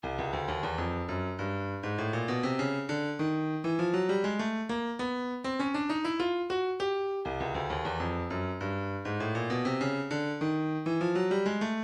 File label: 133 log drum, midi; Soweto